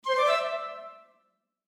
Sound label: piccolo phrase